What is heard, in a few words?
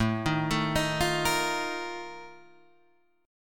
A sharp Major 7th Suspended 4th